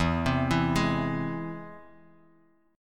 E Augmented, Guitar, DADGAD

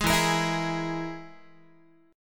E Major Flat 5th, Guitar; strummed; JGuitar

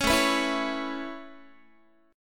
A Major Add 9th A Guitar, chord; 16,17,14,14,17,x; Aadd9 strummed

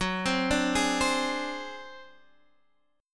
G sharp 7th Flat 5th; 14,15,12,13,13,x; chord shape

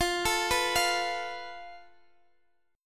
F Major Flat 5th, Guitar, Half Step Down

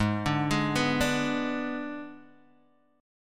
Ab Diminished Ab Guitar Open G

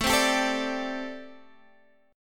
D 7th Suspended 4th, chord

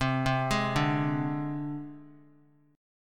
C Suspended 2nd Sharp 5th, down, Guitar; Csus2#5 arpeggiated; JGuitar

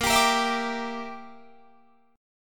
Bb 6th Flat 5th Bb, Guitar Drop D

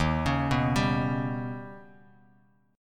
D sharp Augmented 7th D sharp, Bass Standard; D#+7 arpeggiated; 11,16,9,12; JGuitar

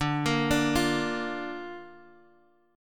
D Minor Sharp 5th D Guitar Half Step Down

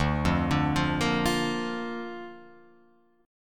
D Minor Sharp 5th D down Guitar Open F; Dm#5 arpeggiated; JGuitar

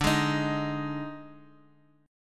Eb Major 7th Suspended 4th D

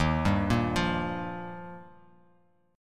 Eb Major Add 9th; Ebadd9 arpeggiated; 11,10,8,10; JGuitar

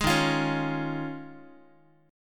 D sharp 7th Suspended 2nd Suspended 4th, Guitar; strummed; 11,11,8,11,x,x; 4A,4E,5A,5D,5G#,6C#; D#7sus2sus4; JGuitar

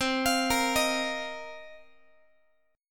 C Minor 11th C Guitar; Cm11 arpeggiated; x,20,20,20,19,x

